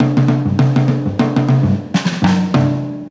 jazz fill 101, drum; BREAKS

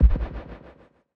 Perc, drums; Touch Loops; BlissedOutBeats

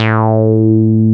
TB 303 BASS

